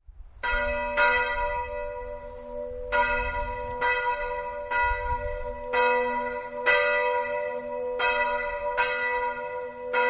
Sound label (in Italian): luincis, campane